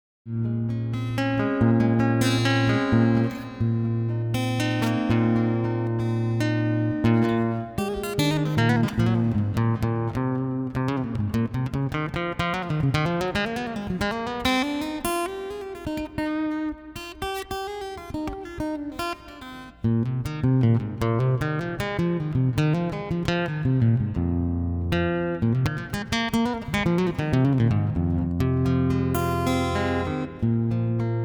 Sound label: Guitar Stuff